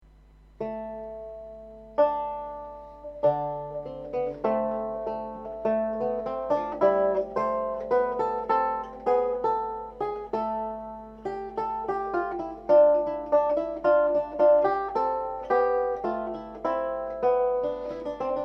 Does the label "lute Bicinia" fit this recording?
Yes